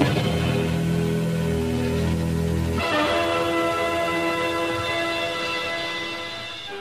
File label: guitar